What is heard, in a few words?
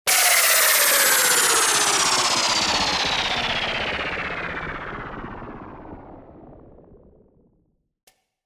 vital presets; chiqui audio; vital fx presets